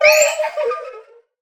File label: allay item given; mob; minecraft